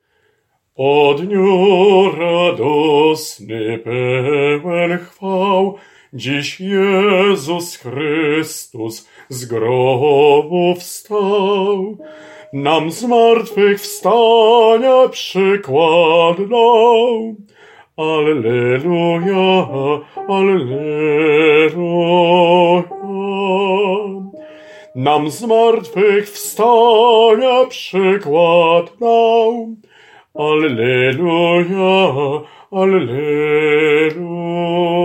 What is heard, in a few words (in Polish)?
O dniu radosny BAS